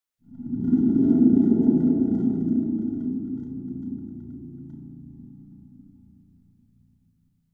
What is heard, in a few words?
Spring Drum Big Shake